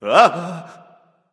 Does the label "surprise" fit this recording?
Yes